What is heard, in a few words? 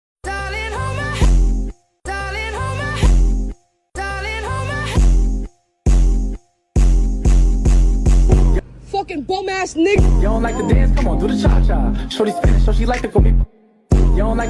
cha cha remix